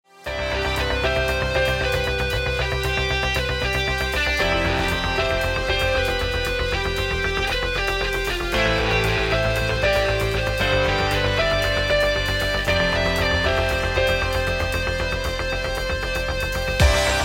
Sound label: Electronic